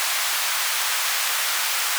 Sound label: 22k Pink